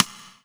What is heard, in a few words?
Korg M3R Side Stick